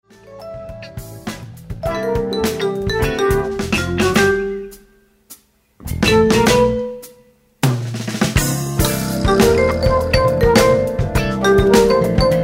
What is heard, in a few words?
Groove Tube